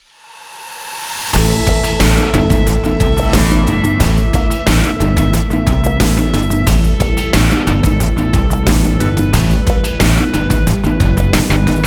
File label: cheerful bass; by burak sound bank; cheerful vol 19